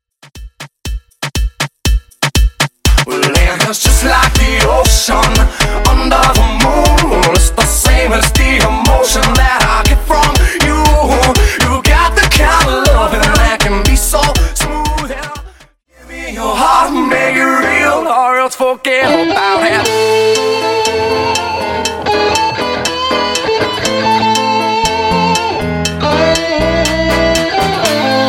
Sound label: Tribal Dancehall Clean 120bpm